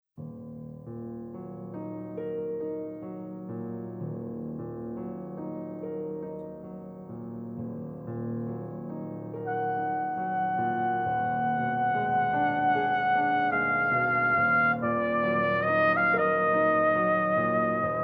Élégie In E Flat Minor

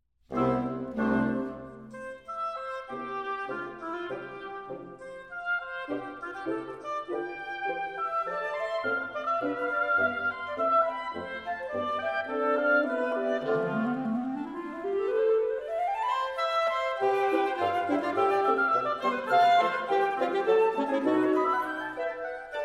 Diversions For Wind Quintet, Allegro; Mvt. 1. Greeting-Allegro

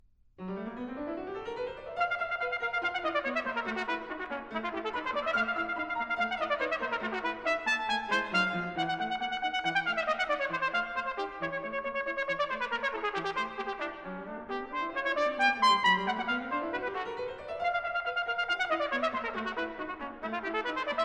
Sonata For Trumpet & Piano - Mvt. 2. Toccata

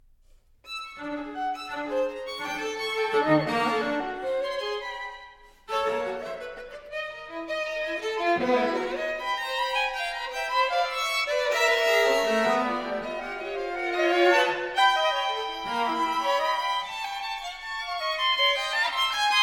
String Quintet; String Quintet No. 2 - II. Moderato- free- moderato

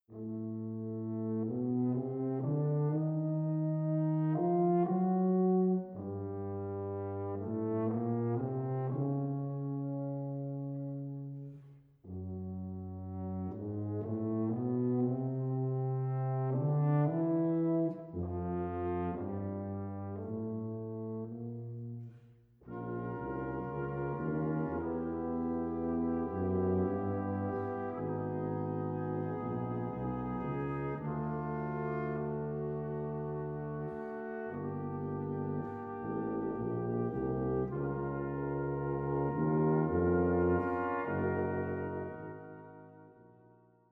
Quintet for Brass, Chaconne

